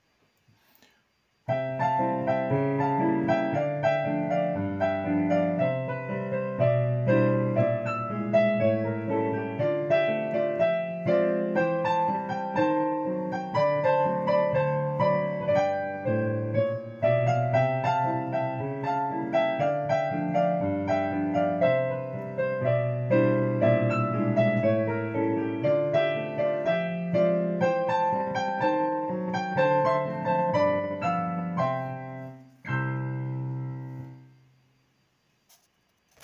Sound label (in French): Rag Times piano solo